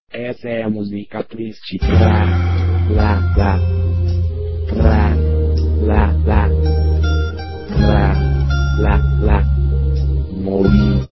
musica triste cantada

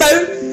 fone

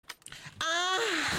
gritin

